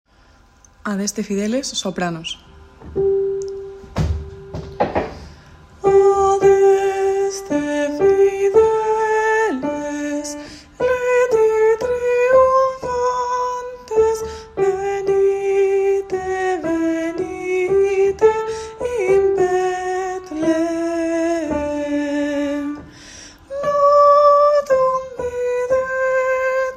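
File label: adeste soprano; Navidad JOCSMAB 2023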